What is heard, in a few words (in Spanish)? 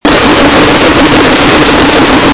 Toques de caja